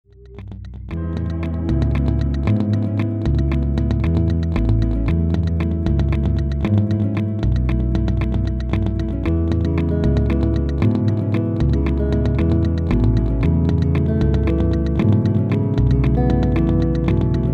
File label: wait music